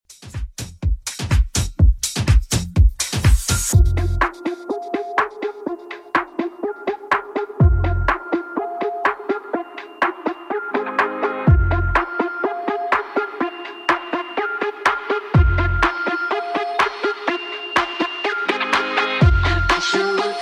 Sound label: Moombahton 124bpm